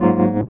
E.PIANO, KEYS

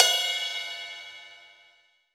Crash Phrase, Roland BK-5 Cymbals

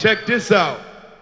VOX GENERAL; Megabass Remix! 2

